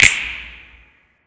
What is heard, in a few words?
snap